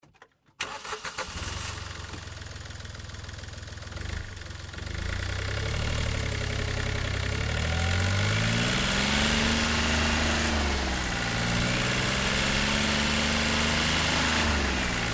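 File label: Car Smart Car Onboard Drive Fast; 2005 Smartcar Fortwo; Vehicles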